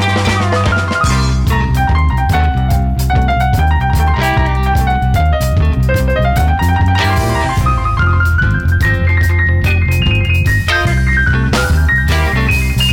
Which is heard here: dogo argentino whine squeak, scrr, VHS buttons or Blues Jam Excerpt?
Blues Jam Excerpt